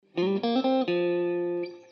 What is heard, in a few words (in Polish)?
zagrywka blues wejscie na bridge zagrywka G F, gitara